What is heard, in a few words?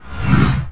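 missile flyby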